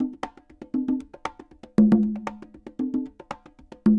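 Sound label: CONGAS SALSA, salsa drums and percussion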